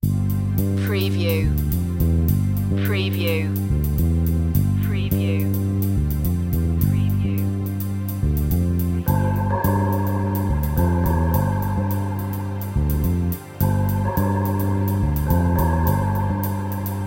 drone maker

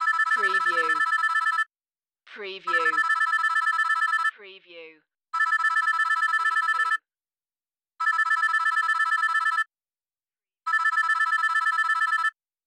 Cell Phone Ring

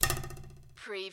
CARTOON RULER TWANG

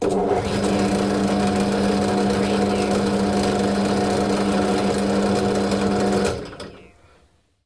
Electric Drill Start Run Stop

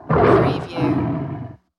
Tiger Snarl Attack

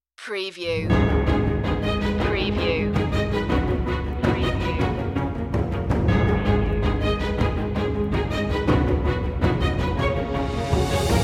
outsider no choir

